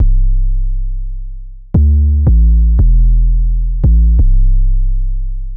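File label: 172 A minor Synth Sub Bass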